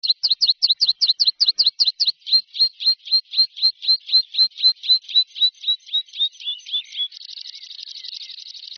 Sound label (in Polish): swiergotek lakowy; aves